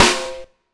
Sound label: SD, Dance Mega Drums; Best Service; SD-HIP